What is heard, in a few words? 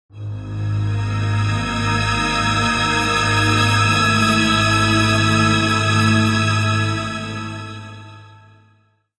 Industrial Foreboding; Civilization Revolution; Xbox